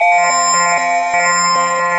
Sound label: Synthesizer Middle C Notes, Lead